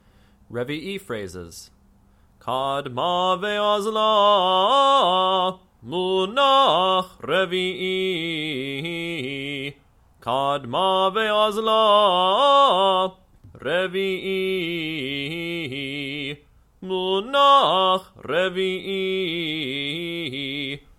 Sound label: Haftarah Trope phrases; Kol Ami — The Northern Virginia Reconstructionist Community